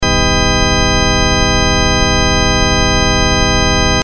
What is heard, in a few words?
Multi Sine 20-20kHz 0dB; LG KG90c Chocolate; TestSnd